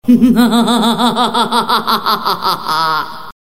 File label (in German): gelachter kirmes